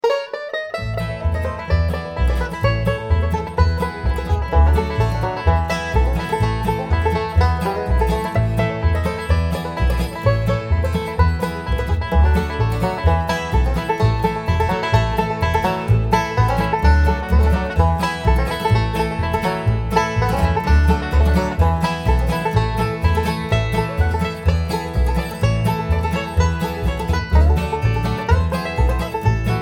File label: banjo signal